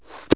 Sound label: catch, toon